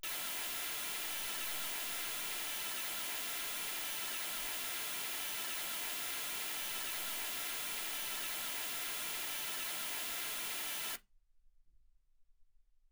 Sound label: mls16 48kHz